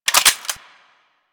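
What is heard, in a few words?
submachine gun Reload; Warframe; Tenno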